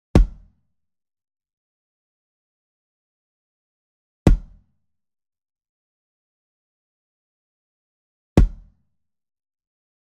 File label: Small Drum Kick Drum Soft Hit Near; boom library; Cinematic Strikes Construction Kit